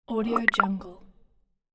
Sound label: pop whoosh